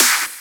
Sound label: Claps; Vengeance; Essential Clubsounds Vol.4